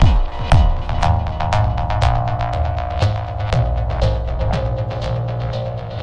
119 echo beat synth 3 bars; SAMPLED MUSIC LOOPS

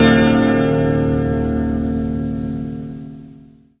noisy thick chord hit, Piano and Epiano

